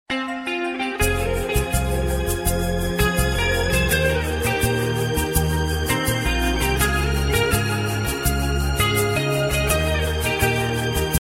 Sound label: instrumental message